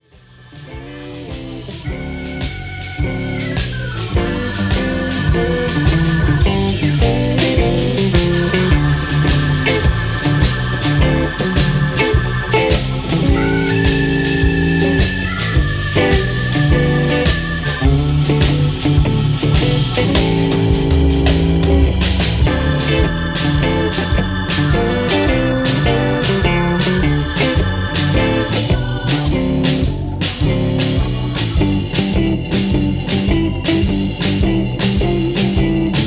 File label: Chicago Blues in D